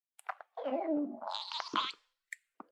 お腹のぎゅるぎゅる音

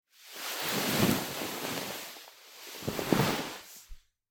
布団に押し倒される

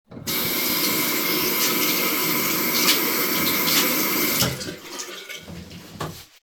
洗面台の蛇口の音

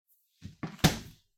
冷蔵庫の扉を閉める音

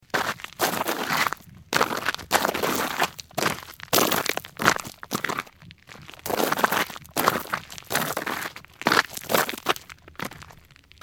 砂利の上を滑りながら歩く足音

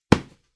スマホをシーツに投げる音

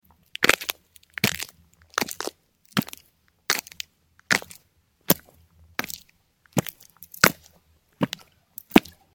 木の板の上を革靴で歩く音; noisu処理第一段階

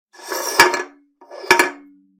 空の缶が転がる音

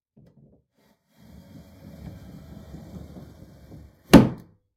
襖を強く閉める音; door

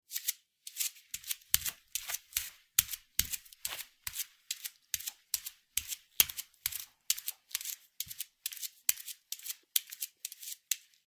フローリングの上を裸足で歩く音

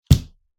内側から壁を叩く音

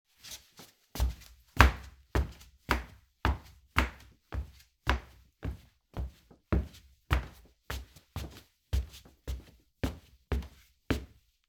古びたフローリングの歩く音; walk

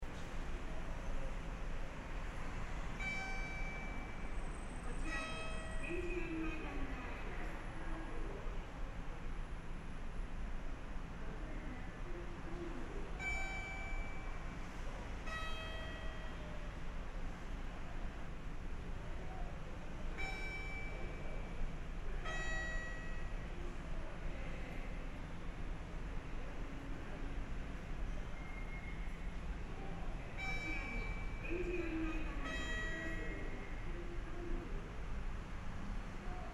人が少ない駅改札内の環境音

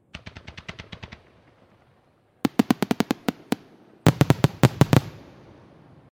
花火の音 連射; firework

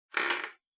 ドアがきしむ音; door

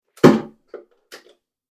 玄関のドアロックを閉める音

